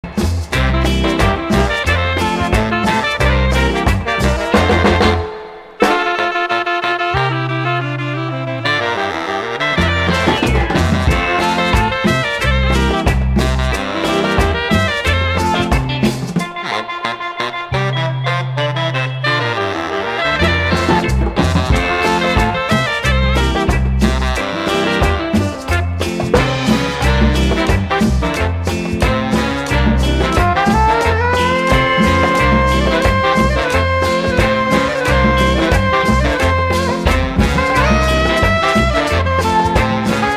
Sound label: techno